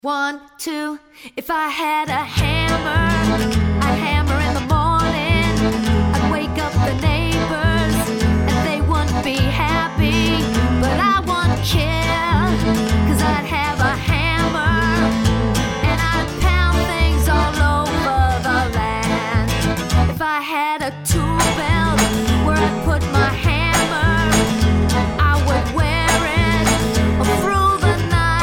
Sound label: with fiddle and hammer